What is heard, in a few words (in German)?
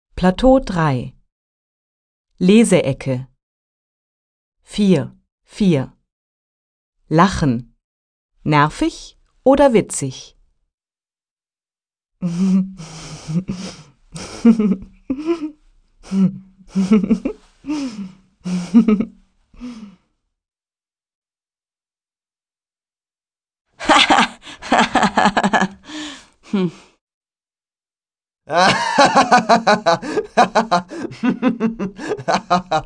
Lachen